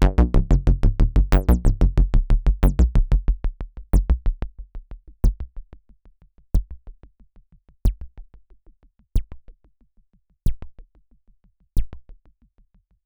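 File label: ACL System 1 Seq1 Filter High Resonance Bleeps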